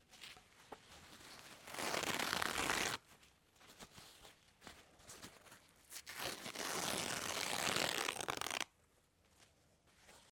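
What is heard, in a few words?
tearing paper sound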